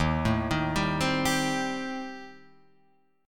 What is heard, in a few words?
Eb 6th Flat 5th Eb down; Eb6b5 arpeggiated; JGuitar